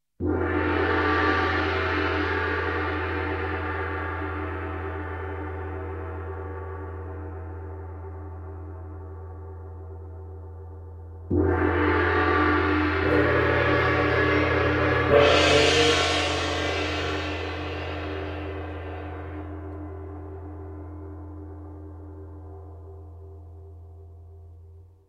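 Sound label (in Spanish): Gong, Percusión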